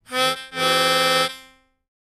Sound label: Mouth-organ, Car/Truck Horn, Type 1 - Low; woodwinds harmonica